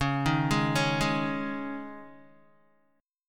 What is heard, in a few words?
C sharp Suspended 2nd C sharp Guitar, chord; C#sus2 arpeggiated; 8,6,8,6,8,x